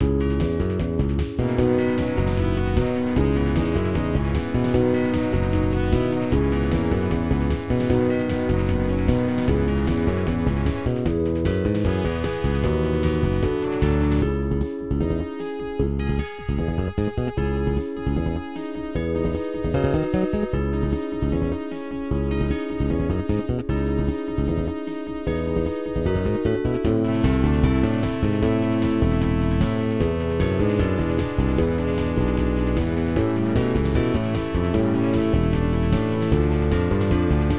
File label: background music; terminal racer